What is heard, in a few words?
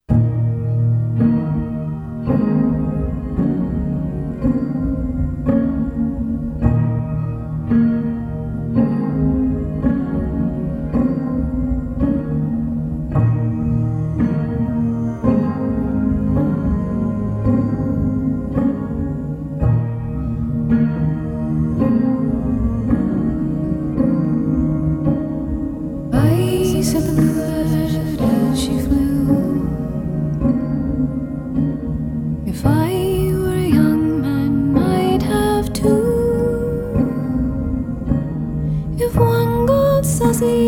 boxharp; leatherwingbat